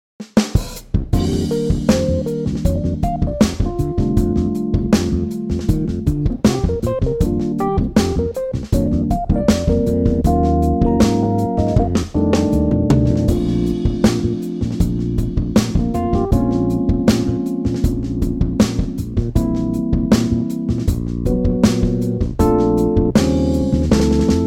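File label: Bb funky, male; key - Bb - vocal range - B to D; Superbly fresh funky arrangement; Very 70's sounding with a classic Rhodes; Superbly fresh funky arrangement of this jazz and vocal classic. Very 70's sounding with a classic Rhodes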